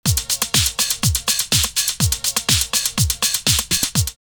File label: 123, drumloops, Rave; X-Static Goldmine; 90 s Sample CDs